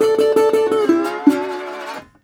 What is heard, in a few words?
120, Blues Guitar; ILIO - Fretworks